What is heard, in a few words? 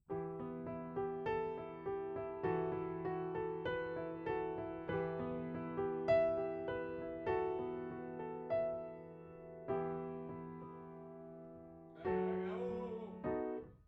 Piano A